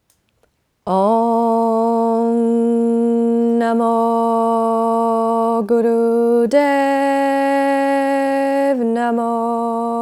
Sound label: Adi Mantra